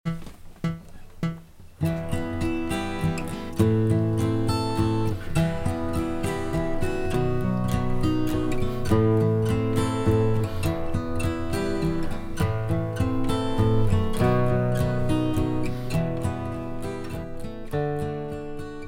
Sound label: Rhythm